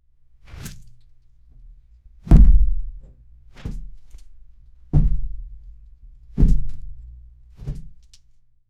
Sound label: heavy two steps of large, tyrannosaurus rex